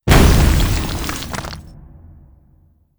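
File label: gate death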